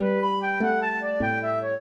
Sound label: trio, flute harp; Mozart